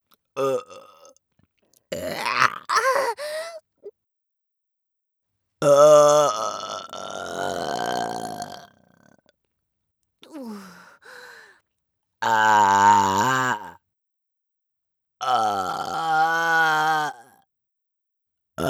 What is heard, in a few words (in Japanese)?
ゲップ切り抜き（左右）